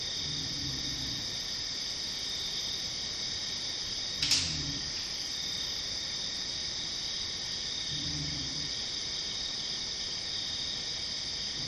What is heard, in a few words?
tree breaking